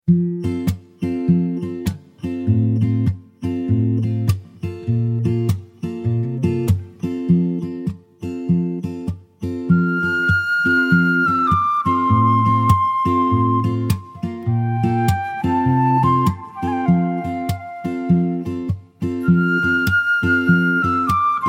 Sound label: rustic flute thought